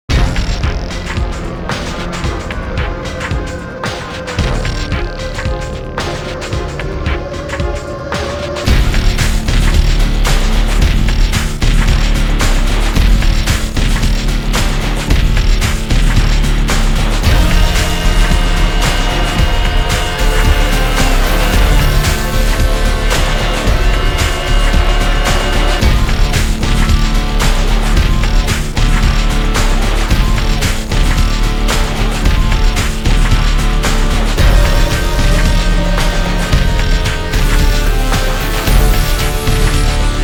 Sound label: Steel Hallway; the unholy war; ps1